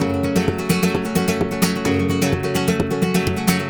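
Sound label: 130 F# rumba minor, Spanish And Gypsy Traditions; Soundscan vol.59; AKAI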